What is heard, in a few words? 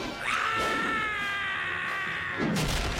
scorpion yell fall